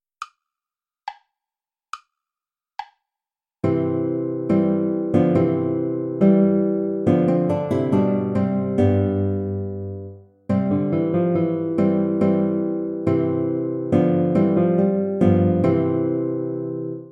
play along without flute; haendel georg friedrich; tochter zion freue dich

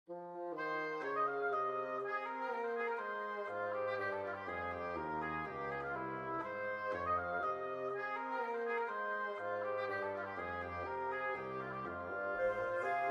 for woodwind trio; Johann Sebastian Bach; prelude from the suite minor

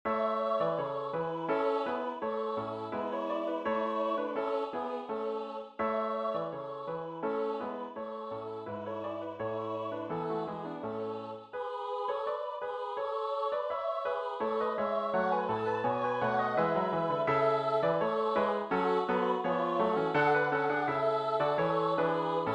deck the halls

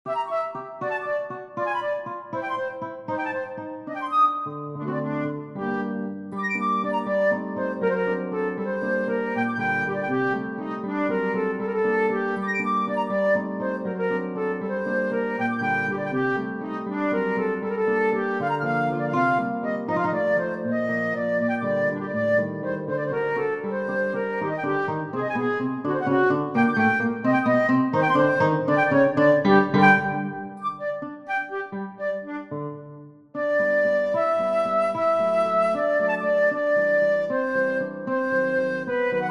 for flute guitar; burgma ller johann friedrich franz the sylphs from tudes

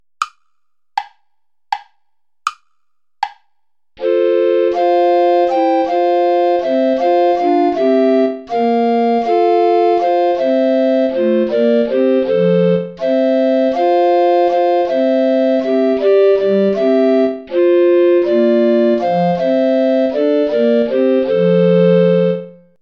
play along without soprano recorder

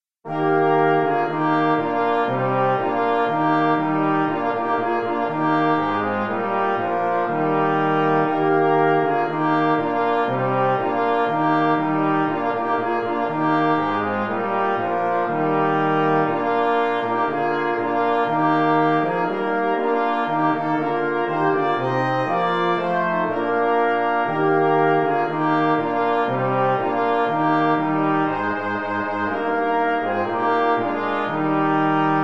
deck the hall

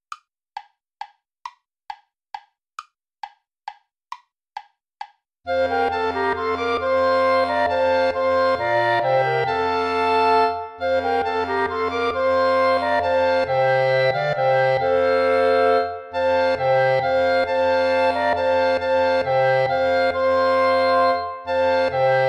volta play along without bass clarinet; praetorius michael

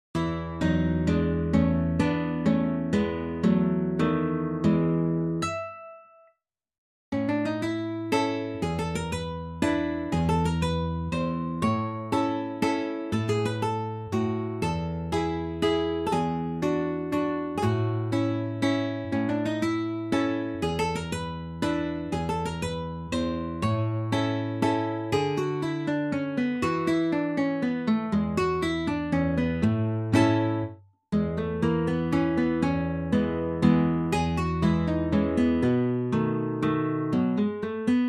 easy pieces for guitar

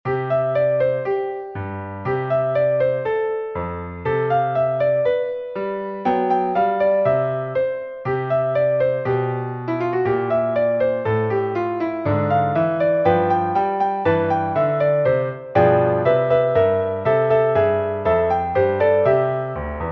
jingle bells; pierpont james

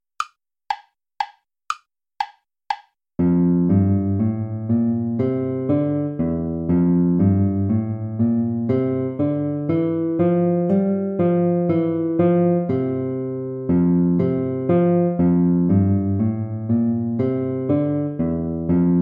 baroque minuet major play along

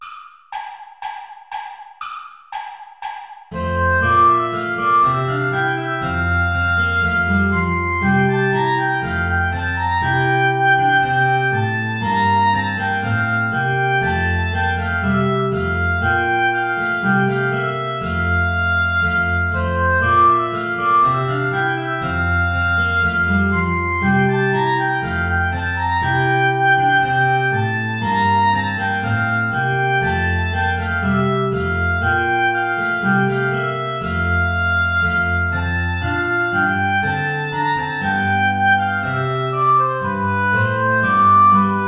bourree play along without guitar; praetorius michael

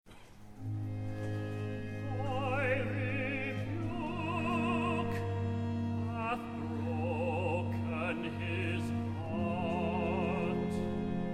concert choir recitative for tenor; thy rebuke hath broken his heart

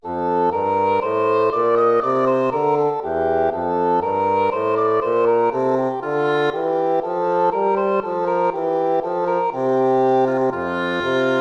baroque minuet major

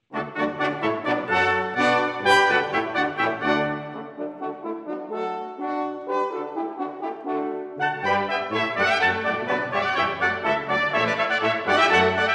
bouree in d; haendel georg friedrich; water music suite

